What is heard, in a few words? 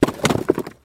rock